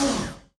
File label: P-51D Actuator Oil End; DCS